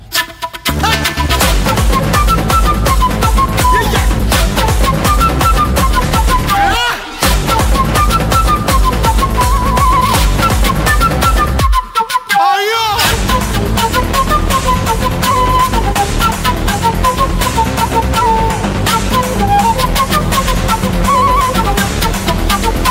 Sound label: Master Flute